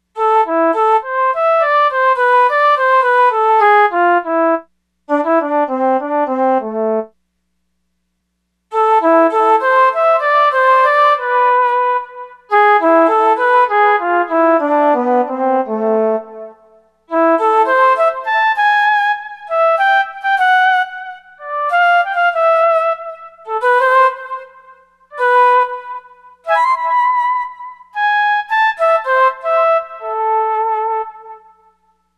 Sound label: MULTI VOCAL